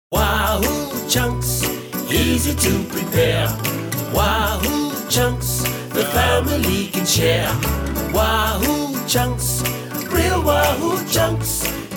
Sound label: jingle; wahoo chunks; corporate work